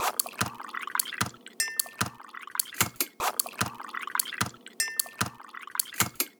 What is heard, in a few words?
Percussion Loop, Percussion Foley, 150bpm; Dubstep; Percussion Foley Loops; Ghosthack; Dubstep Sound Arsenal